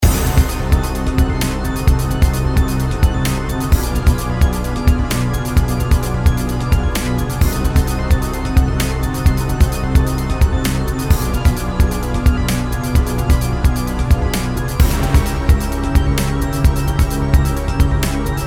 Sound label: boss loop 130 BPM, action game music; アクションゲーム; Action Game Music Pack